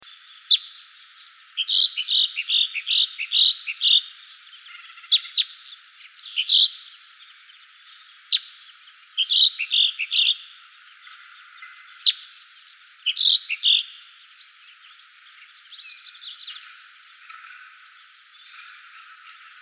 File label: Red Crossbill, Loxia curvirostra type 2; finches